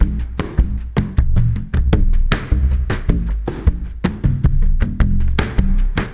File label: ICE BRUSH REMIX, Liquid Grooves; Spectrasonics; Liquid Grooves 1 & 2